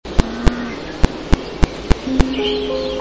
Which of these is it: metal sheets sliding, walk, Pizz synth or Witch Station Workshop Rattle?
walk